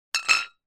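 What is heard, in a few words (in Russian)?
кружки пивные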